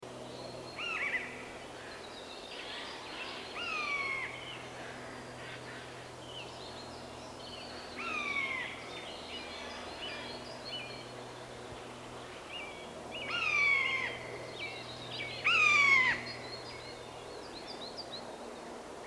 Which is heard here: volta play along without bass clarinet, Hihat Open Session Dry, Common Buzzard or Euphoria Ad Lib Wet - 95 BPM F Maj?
Common Buzzard